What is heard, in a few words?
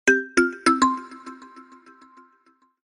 Ringing, notifications; Infinix; XOS 10